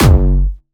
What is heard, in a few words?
Jumpstyle Kick G1, vengeance essential bassdrums; Jumpstyle Multis; vengeance essential club sounds-3; VEC3 Bassdrums